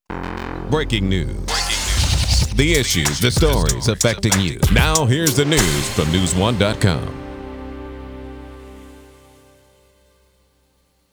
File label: News Opener; broadcasts